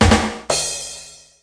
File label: rimshot